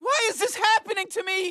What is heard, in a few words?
Respawn